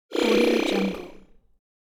alien laser

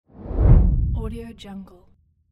cinematic whoosh

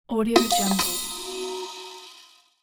ratchet hit

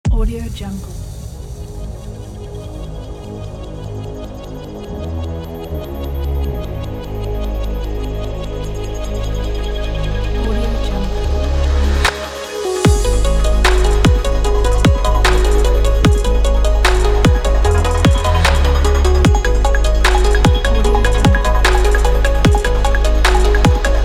technology electronic abstract; imgsound

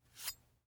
knife fast scraping draws pull out

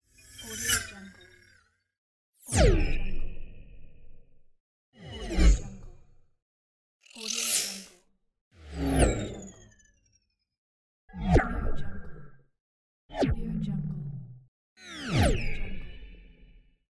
digital whoosh

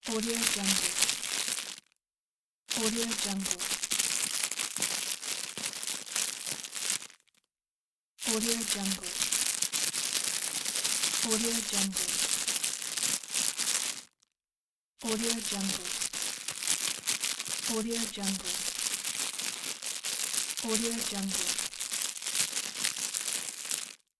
the rustle

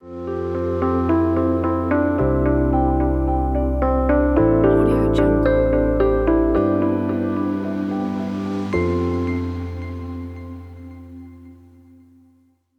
nature logo

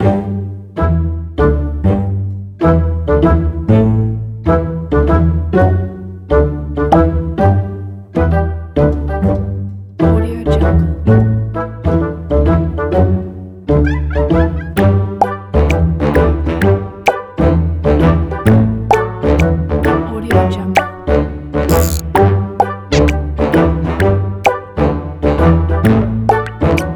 sneaky comedy

